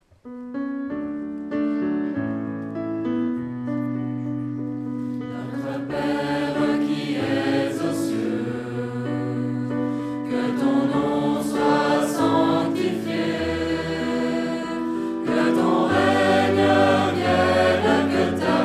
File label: Schola